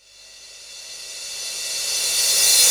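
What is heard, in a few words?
crash reverse, Dance Mega Drums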